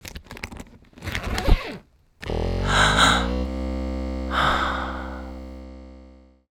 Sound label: Sound Logo Intro; sonic branding; girlonthenet